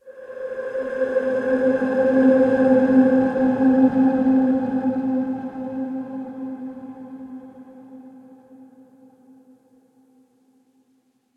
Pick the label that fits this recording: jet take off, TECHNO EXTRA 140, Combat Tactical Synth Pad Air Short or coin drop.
Combat Tactical Synth Pad Air Short